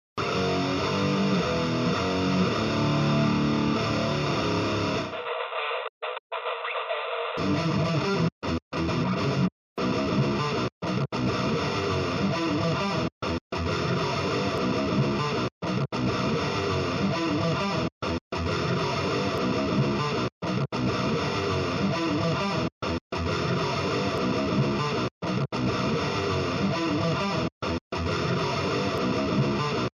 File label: new git test abmischung, metal drums gitarre